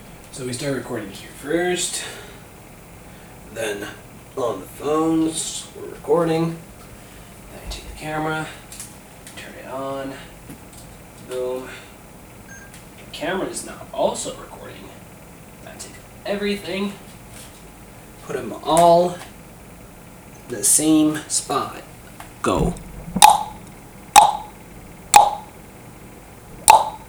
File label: computer